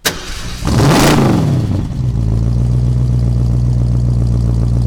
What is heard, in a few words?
68 Mustang Start Loop; Automobiles